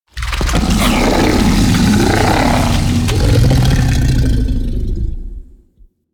Monster Puke; Warframe; Infested

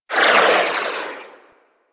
Swarm Missile Projectile By; Weapons; Warframe; Sentinel; Helstrum

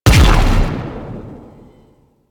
Tengu dive bomb; Warframe

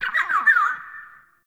Forest Bird Mid; Ambience

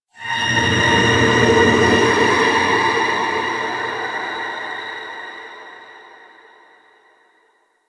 Random, Ambience Interior; Warframe; Orokin

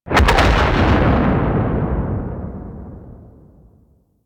Enemy Ship Initial Death Explosion; Rail Jack

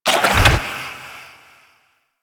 Sandblast Cast; Warframe; Mummy

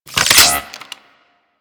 Spectra Reload, weapons

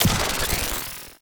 Ammo Efficiency Ability Hit; Warframe; Helminth Abilities

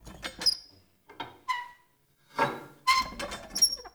Light Mirror Hang Squeak; Puzzles

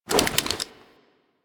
Akimbo Reload Arm Raise; Characters; Warframe

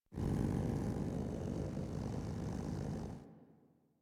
Kavat Vocal Idle; Warframe; Khora

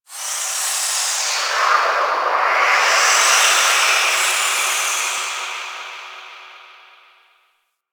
Moon Wind Small, Ambience; Warframe; Orokin

